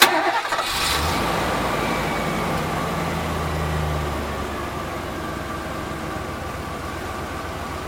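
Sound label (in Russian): оглушительный резкий рев двигателя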